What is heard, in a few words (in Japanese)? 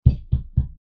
外側からを壁を叩く音